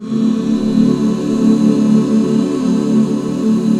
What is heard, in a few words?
D 7; Best Service Gigapack 1